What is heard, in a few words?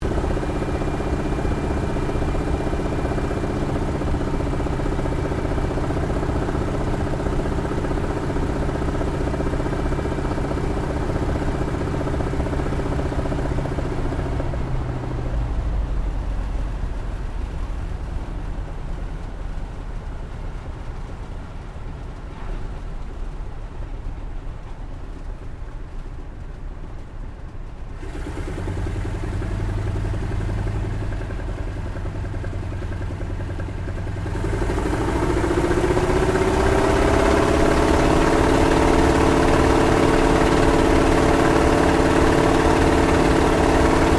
AIRCRAFT CAC WIRRAWAY TOUCH N GO INTERIOR; CA-16 Wirraway; Airplanes (Sound Effects:Vehicles)